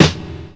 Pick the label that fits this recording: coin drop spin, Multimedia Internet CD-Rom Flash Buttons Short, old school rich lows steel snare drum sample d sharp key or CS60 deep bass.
old school rich lows steel snare drum sample d sharp key